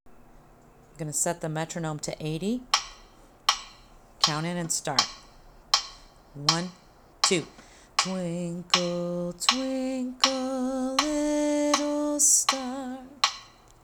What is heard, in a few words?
Twinkle Twinkle Count In